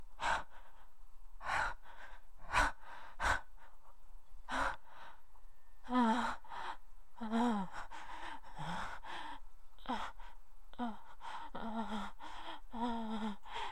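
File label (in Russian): оргазм резкий низкий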